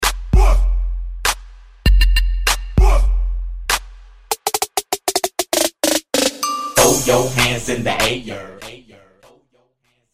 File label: 90s Old School Mainstream Clap A Pella Starter Hype No Hype; Mainstream VIP